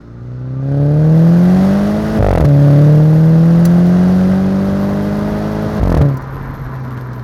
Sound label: f56s with gp3 exhaust mini gp3 exhaust test; f55-f56; hatch